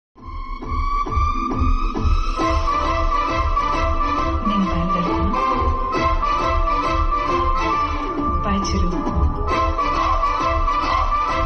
movie interval bgm ringtone bgm

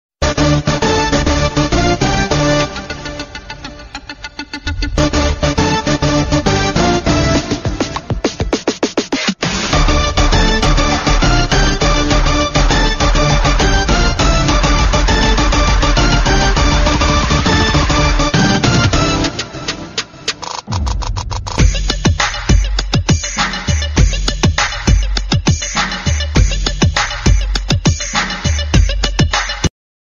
agnathavasi interval bgm ringtone; pawankalyan

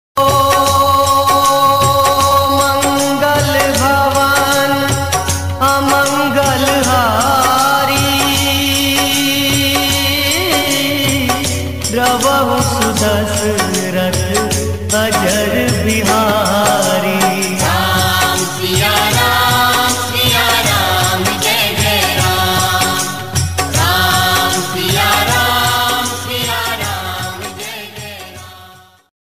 bhakti ringtone, hindi ringtone

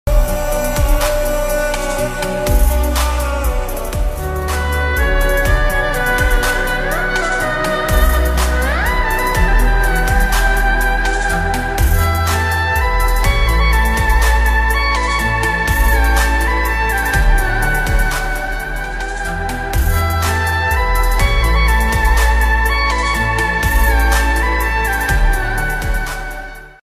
ringtone slow reverb background music sad

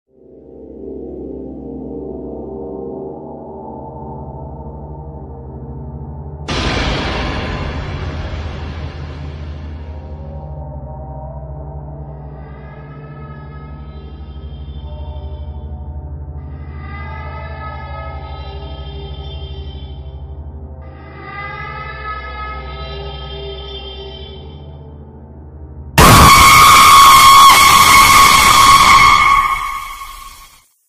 bhoot ringtone horror ringtone ghost ringtone; kanchana ringtone; horror video

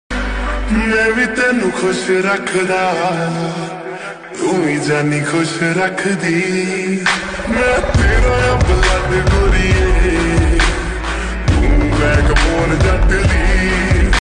lofi mix; tmtstudioindia; dance transition

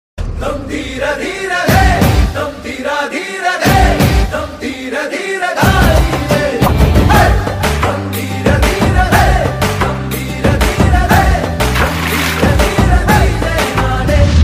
teaser bgm ringtone; Varun Sandesh

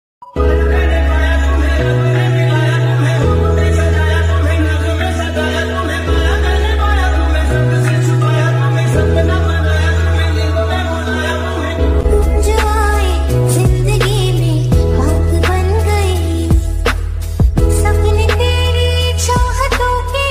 sister birthday song ringtone

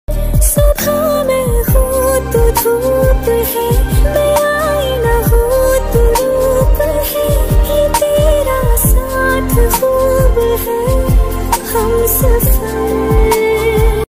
female version love sad song, hindi

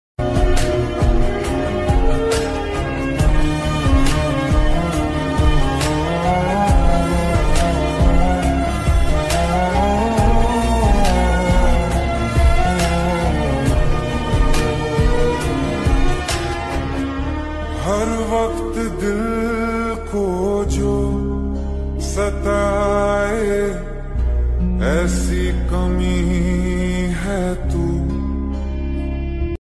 ringtone instrumental ringtone

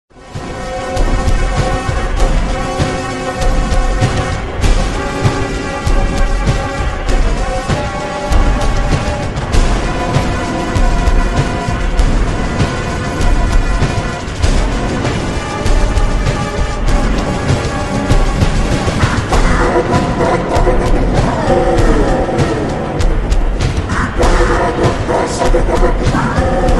tamil bgm ringtone; captain miller

